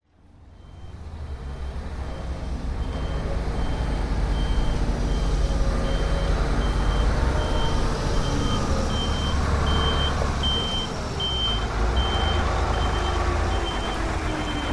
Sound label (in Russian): zvuki betonomeshalki